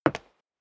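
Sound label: step dress boots wood parquet single left, footsteps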